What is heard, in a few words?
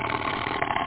tiger; kidsplay